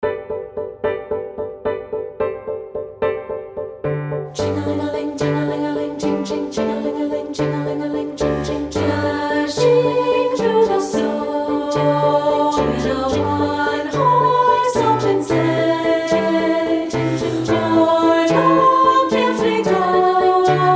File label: Jingle Bells